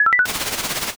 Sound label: fax